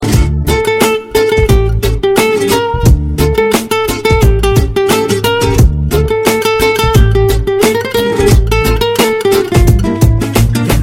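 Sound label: guitar, dance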